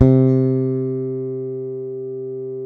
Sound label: bass, sustain; Sampleheads; Will Lee Bass Library